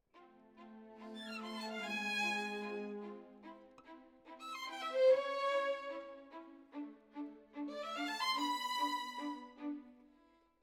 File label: Violin Solo